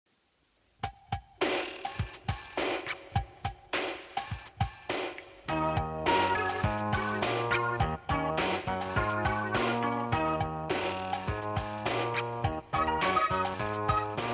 Funky Bass n Wobble Pan Flutes

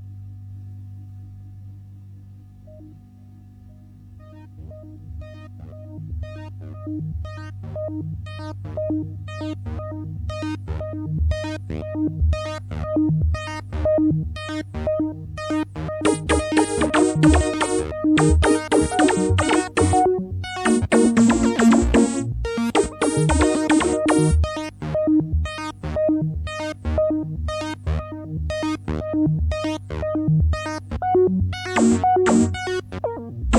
Synth Pop Trance; tape

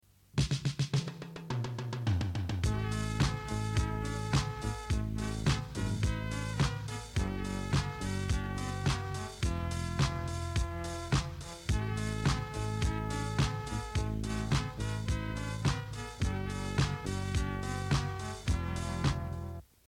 String Intro

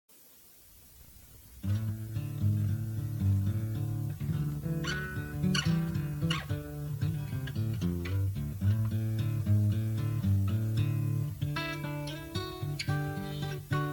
Guitar Elec Metal Ballad

